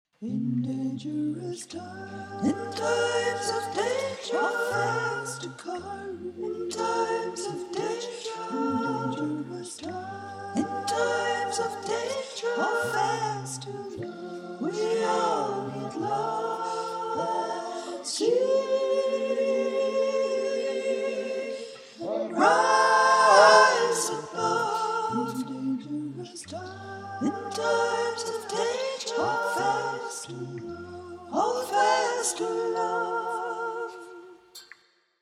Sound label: dangerous times chorus high backing